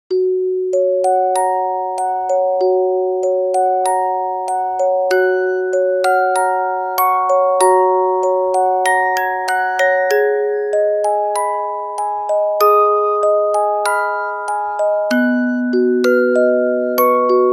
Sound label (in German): orgel